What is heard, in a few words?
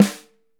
5X14, Drums